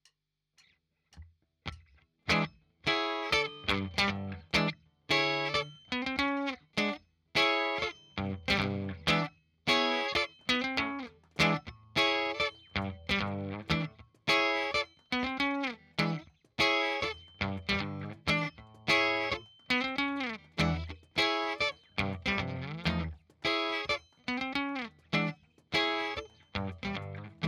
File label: Guitar